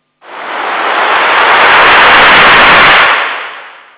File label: wave